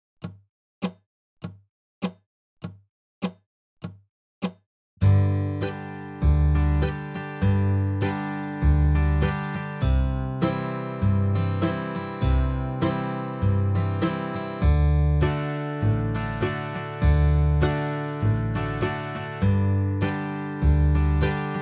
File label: Strum Machine 1 4 5 in G 50bpm